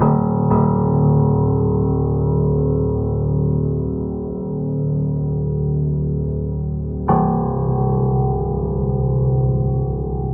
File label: piano, Loops, Sad